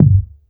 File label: IN HOUSE HI, bass; Sampleheads; Ultra Freakin' Bass; IN HOUSEBASS